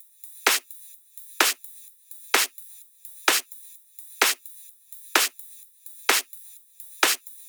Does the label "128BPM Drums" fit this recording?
Yes